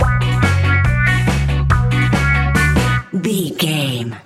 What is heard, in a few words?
style modern Ska music! Classic reggae music with that skank bounce reggae feeling.; Baggy trousers style modern Ska music!